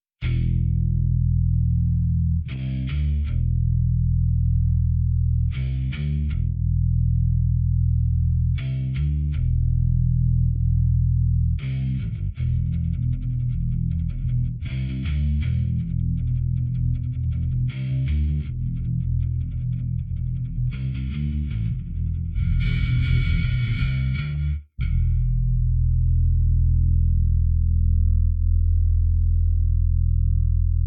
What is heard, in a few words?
Bass